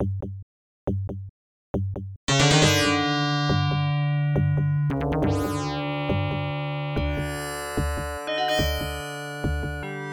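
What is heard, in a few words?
Heartbeat, game music; prince of persia; ibm pc at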